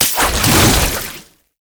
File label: Wall Climb Dodge Start; Warframe; Oraxia